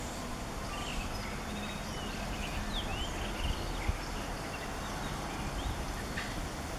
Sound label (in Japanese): イソヒヨドリのメスのさえずり